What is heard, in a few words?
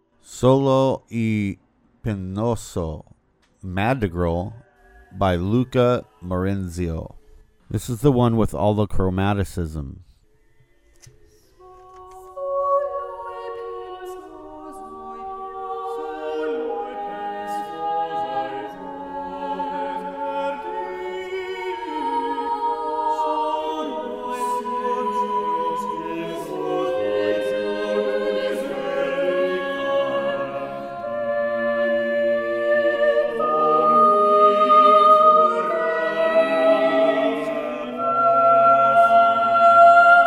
madrigal; Luca Marenzio; Solo e pensoso